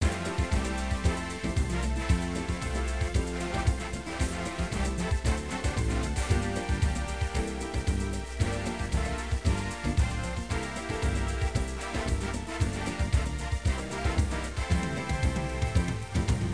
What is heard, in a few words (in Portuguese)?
trilha; GEOGRAFIA